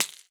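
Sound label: MARACAS; Xsample VOL-9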